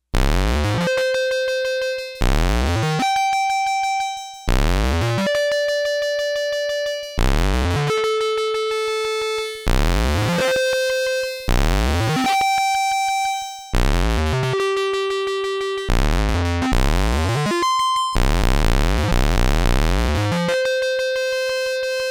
Chaotic Entrance, Arturia MicroBrute; Factory patch sheets

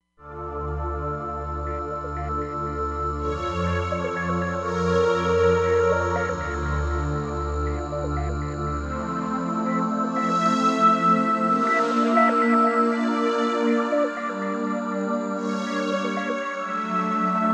Pad, Roland Fantom XR; Fantomas Pad

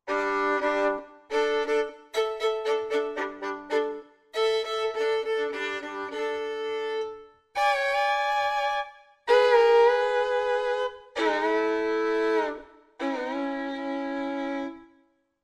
Fiddle FX menu; Country; Roland SR-JV80-17 Country Collection

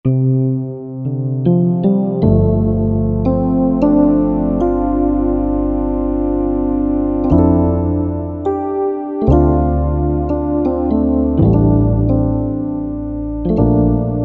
Soft Jam, Korg MSC-8S Percussion

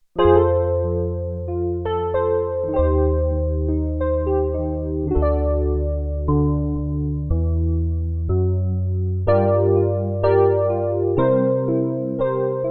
Piano, Roland JX-8P

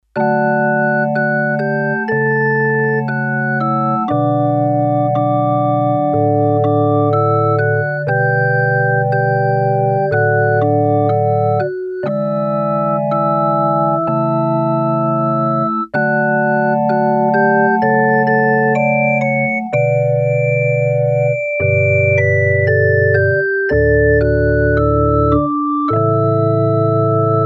Organ, Korg DS-8; Factory Patches

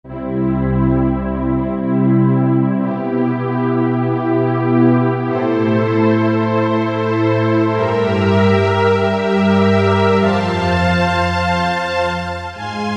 Softstring, Korg T3

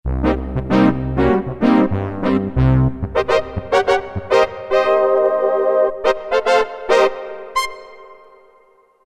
Syn Brass 3, Roland MT-32

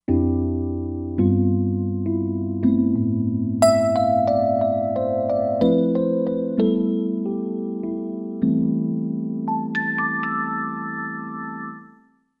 Crystal EP, Roland Fantom XR

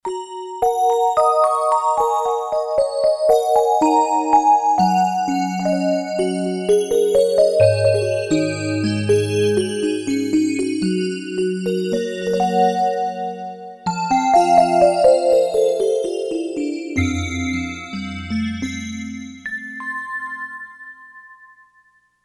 ORGAN BELL, Roland SC-880; Factory Patches

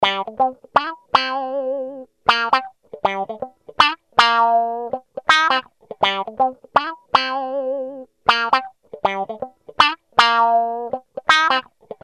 Funky Electric Guitar; Apple GarageBand 2; Apple Loops

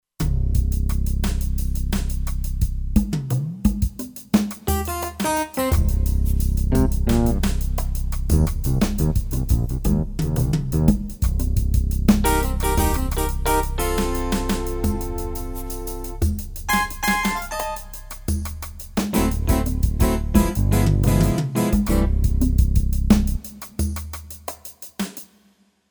Latin Comper, Kurzweil K2500X

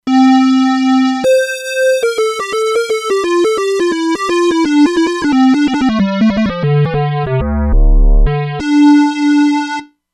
Square; E-mu Proteus-2

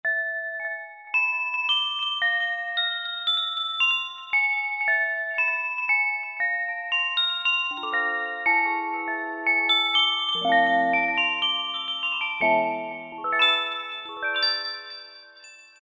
Bell Ring, Korg M1; Factory preset examples

